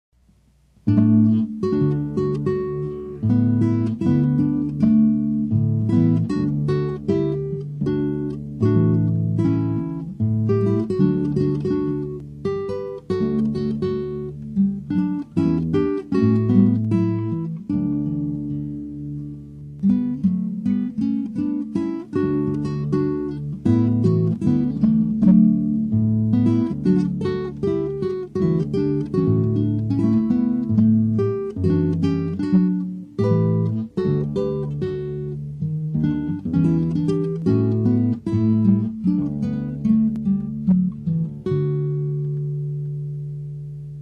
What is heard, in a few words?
Guitar